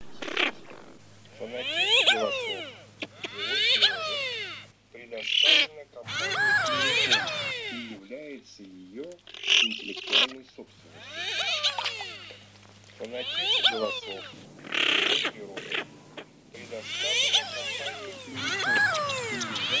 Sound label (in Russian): Красноголовый нырок Moriglione; plurifon